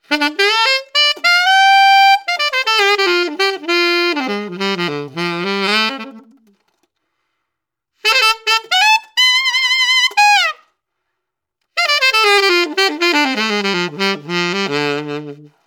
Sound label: Funky E; Legere